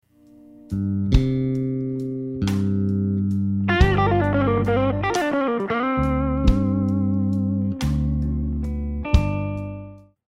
G Slow Blues Lick, guitar

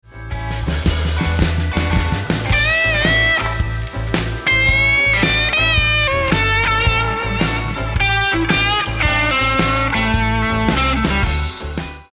Licks Lick, guitar; Beginner/Intermediate Shuffle Blues licks

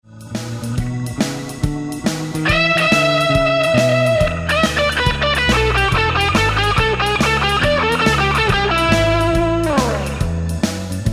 Lick, guitar

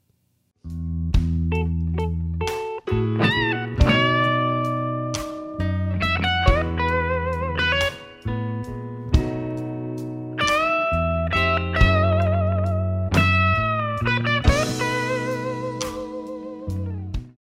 Slow Blues in G Lick, guitar

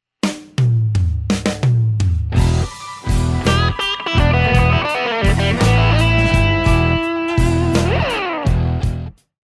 E Blues Lick, guitar

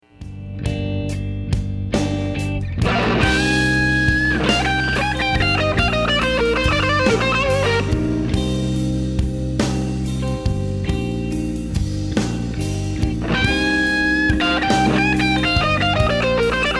C Minor Blues Lick, guitar

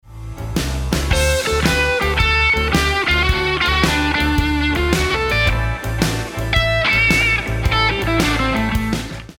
Licks Lick, guitar; Beginner/Intermediate Shuffle Blues licks

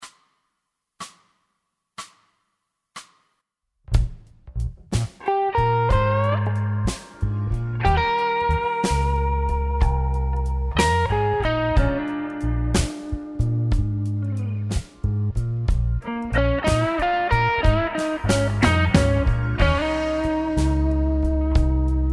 Slow Blues in Bb Beginner Solo

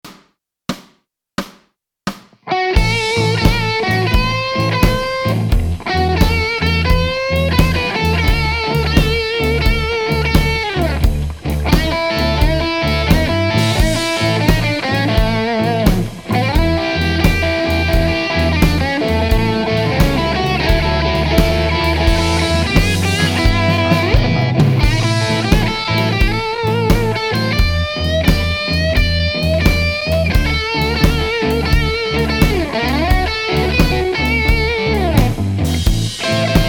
Beginner Solo

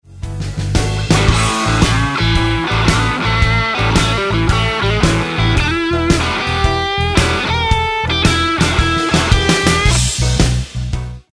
Roadhouse Licks Lick, guitar; 20 Roadhouse Licks

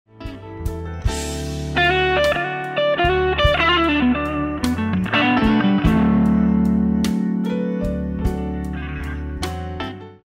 Blues Lick, guitar; Blues Guitar Method 1

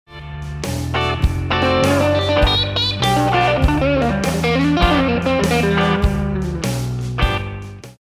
Reconsider Lick, guitar

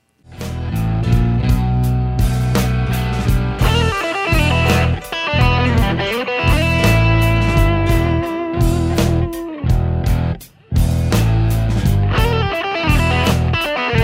E Blues Lick, guitar; 19 Blues Licks

